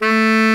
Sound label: TENOR SAX A3; Greytsounds VOL-1